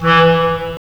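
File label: wind clarinet; Roland - LA Composer vol. 3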